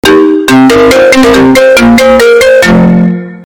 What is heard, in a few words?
iphone ringtone